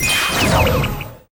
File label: Priest Sun Ray; Inotia 3